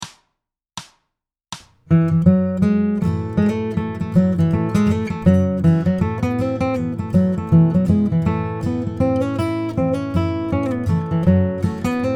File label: Guitar; Jack Tuttle’s Bluegrass Guitar Primer; solo song